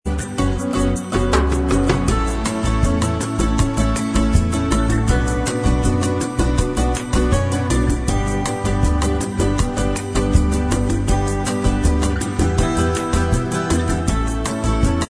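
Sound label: bossa style, instrumental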